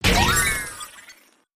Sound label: small Win; netent; whenpigsfly